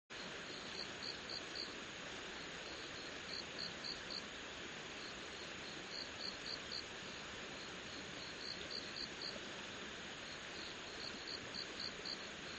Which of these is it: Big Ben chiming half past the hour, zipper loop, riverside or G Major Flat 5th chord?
riverside